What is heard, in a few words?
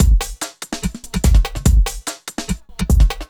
kika 145